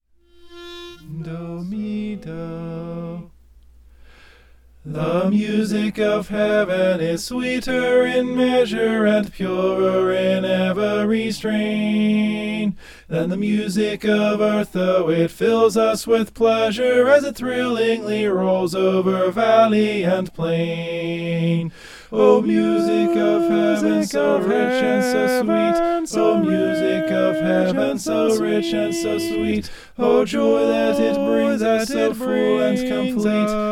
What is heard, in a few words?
the music of heaven soprano